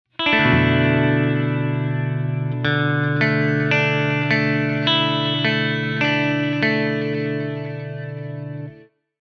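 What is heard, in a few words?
guitar Delay digital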